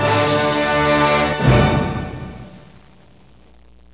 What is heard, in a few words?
TROMBON, ORCHHITS; MUSIC